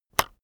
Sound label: keyboard external enter release heavy